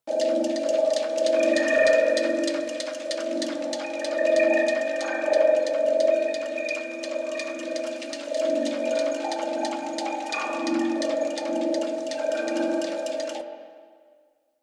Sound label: Treasure Loop; Warframe; Ambience; Lore